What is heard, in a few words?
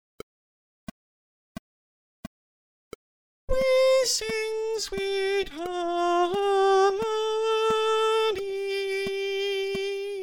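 soprano part, choir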